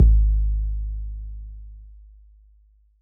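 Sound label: bass drum T8 Long, Yamaha DJX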